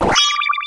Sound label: refine failed; RO Sound effect